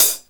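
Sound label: HIHAT HALF OPEN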